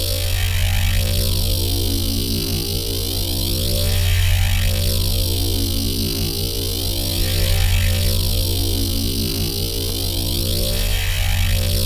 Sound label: robot Battle; spacemap; robots